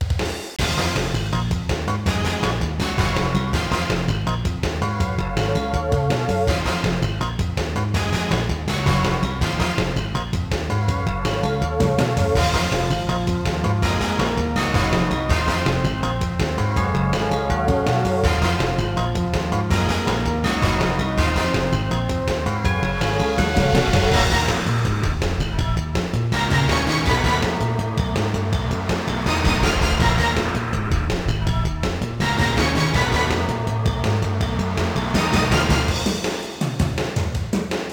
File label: Funk; Avengers in Galactic Storm; deco mlc system; arcade